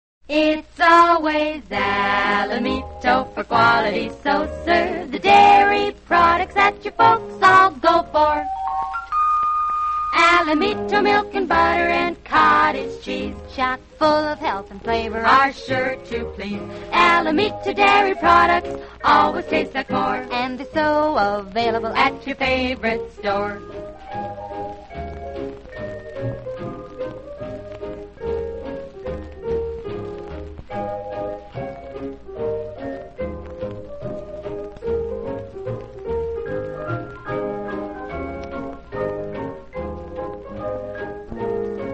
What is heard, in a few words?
radio jingles; alamito dairy; allied radio artists